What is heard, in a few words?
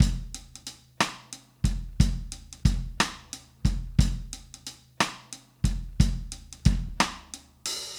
Drums, 70s Analog Funk